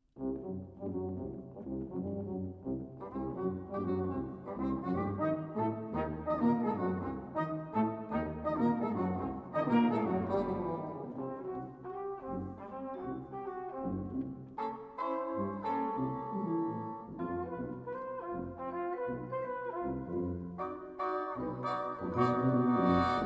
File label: brass